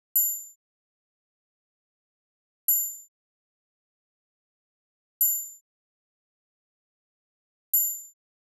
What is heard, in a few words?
Drum Loop - 95 BPM Percussion, West Coast; Loop Stems; Cymatics; GHOST (Beta Pack); Alameda